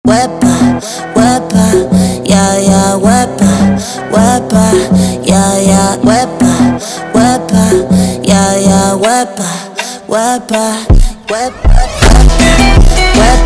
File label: Funk Slowed